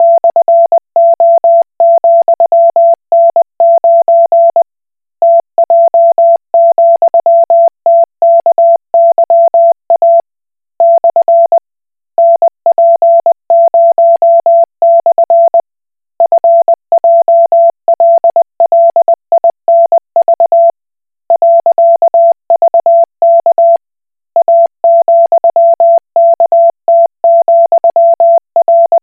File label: Koch Morse